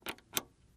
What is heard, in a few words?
Buttons